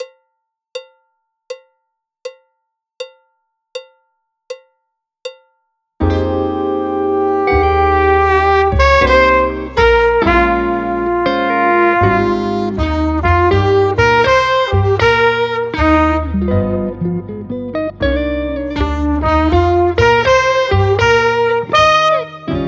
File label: Drum Track B